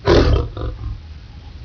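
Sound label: lion roar; ANIMALS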